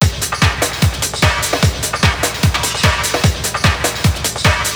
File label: Result Aa Jingle; beatmania IIDX 20 tricoro; system BGM